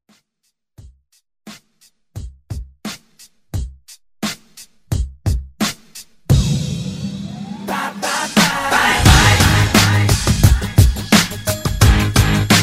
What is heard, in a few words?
90s Pop No Hype Redrum